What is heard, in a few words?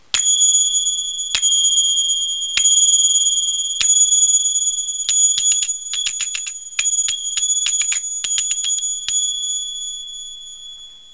zills